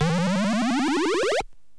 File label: burururu